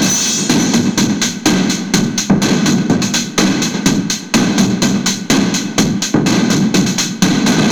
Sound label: Processed Beat, Drums & Percussion